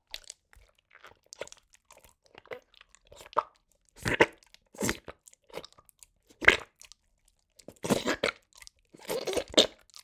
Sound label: Slime Jelly Gross